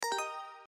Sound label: credit end; WetAndJuicy